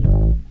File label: BAS FX; INSTR LOP; E-Lab; X Static Goldmine 2; akai